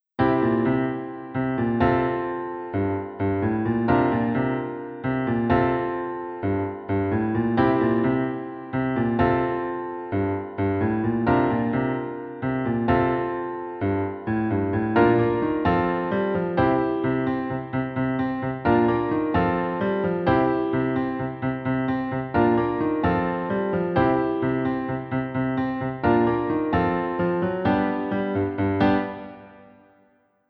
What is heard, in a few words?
Piano Fitness